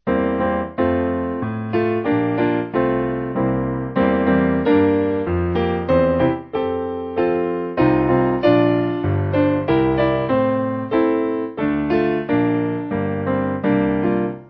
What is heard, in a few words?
Praise To God, Stenka Razin, piano